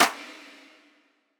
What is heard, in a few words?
Clap, Hip Hop; Drums; One Shots; Humble Stars Clap; Cymatics - Humble Hip Hop Sample Pack